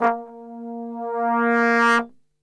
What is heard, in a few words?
A#1, Brass; Best Service; Brass Super Section